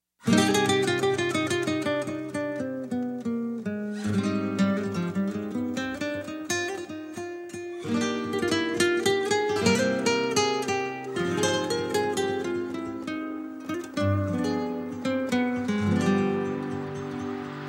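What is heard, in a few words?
background music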